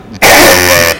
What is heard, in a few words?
cough; shroom of doom; game; entity; death